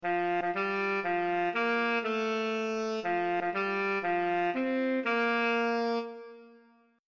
Happy Birthday Sax

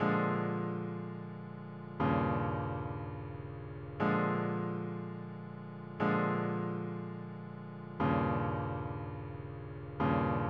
11 bar blues

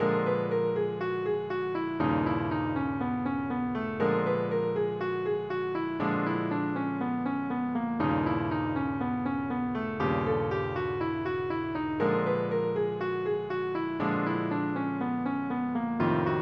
blues changes altered

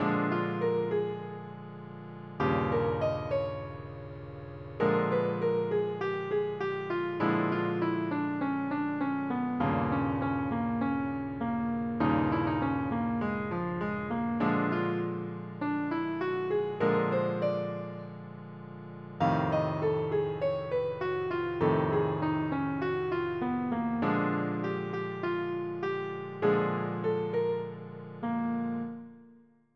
blues changes solo

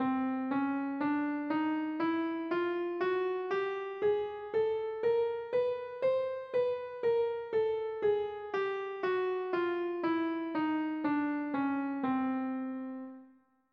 C chromatic scale

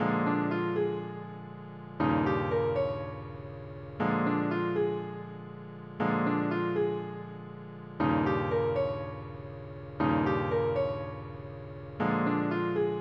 blues changes

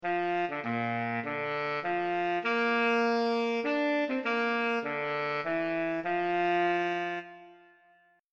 sax; Star Spangled Banner

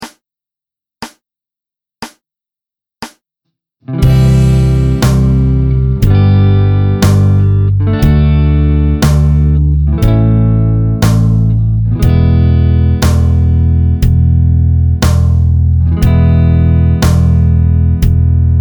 Chord Progression

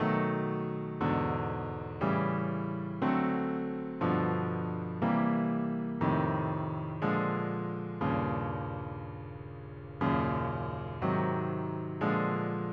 Coltrane Changes

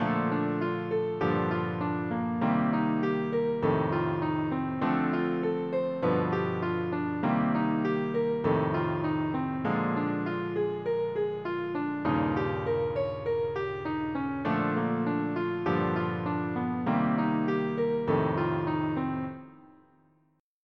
rhythm changes

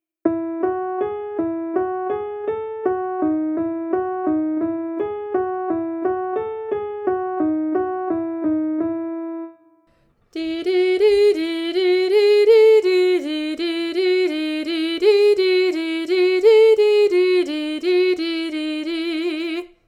Vocal Exercise

